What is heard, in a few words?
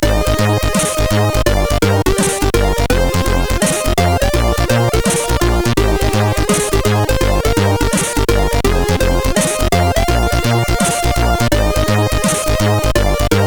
Intro, CSG8580R5